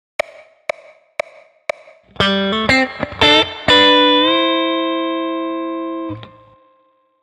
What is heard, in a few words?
extreme bends multiple intervals